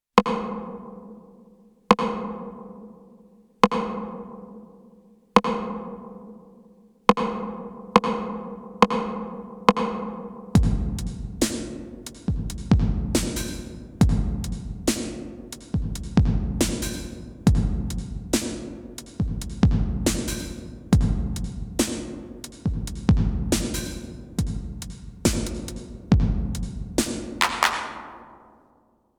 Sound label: Sony DRE 2000 TR909 Sony MU R201 Hall